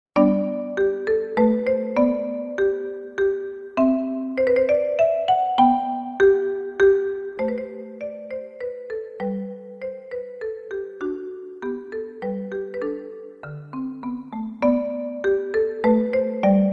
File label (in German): Menuet, klassik